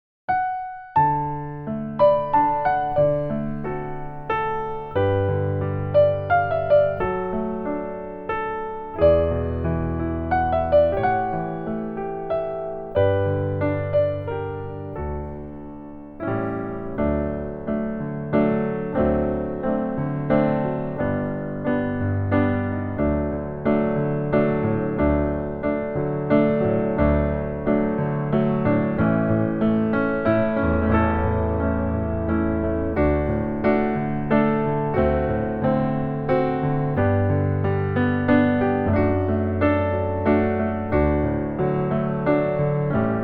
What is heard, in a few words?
Traditional Amazing Grace 90 BPM, violin